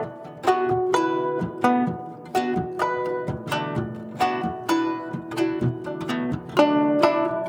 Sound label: Guzheng Poly-Rhythm; Continental Drift Aftershocks